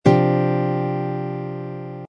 c suspended second suspended fourth chord voicing, guitar